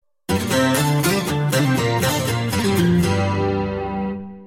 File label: End Guitar